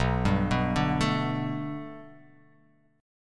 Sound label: B Minor 7th Sharp 5th B, chord